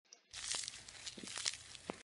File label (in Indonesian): jerapah